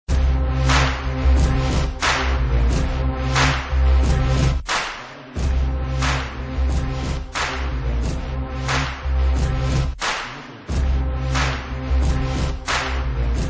Drums; Mankatha

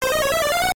Spell

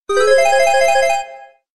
Future Ring

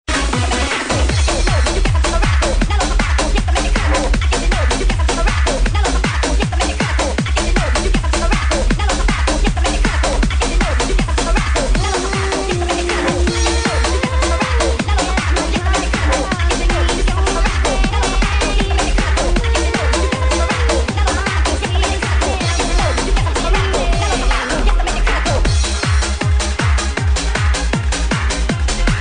Another Bouncy Tune

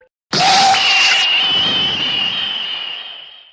Blast; Broly

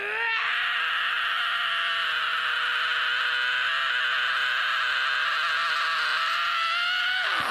Screaming; Deku

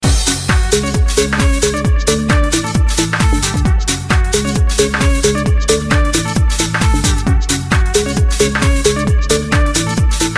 Get Funky Drop

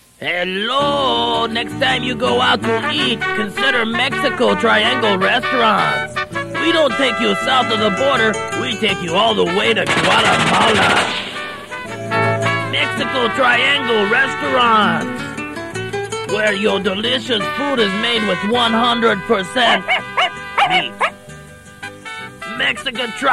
Mexico Triangle

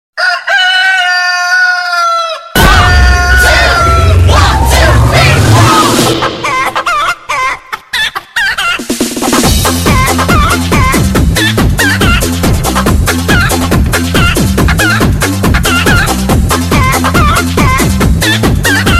Chicken

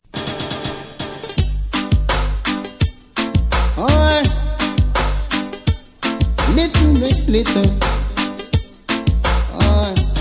Tribal War

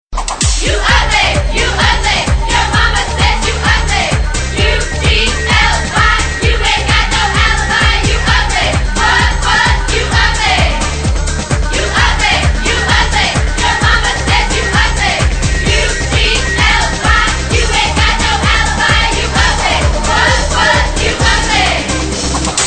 Cheer

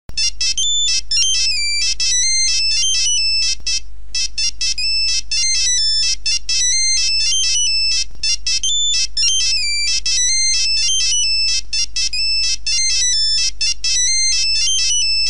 Wake Up Sound

